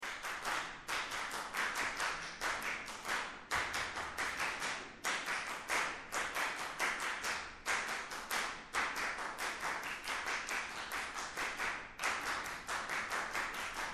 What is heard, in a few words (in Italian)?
percussioni